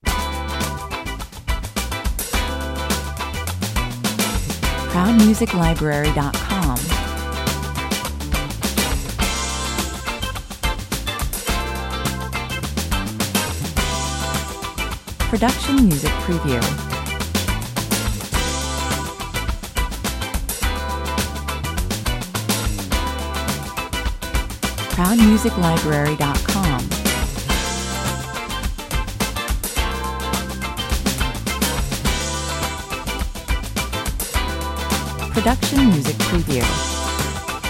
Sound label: Mid- to Up-tempo jazz/funk riffing. Horns top line, harmonies added each chor, 105 bpm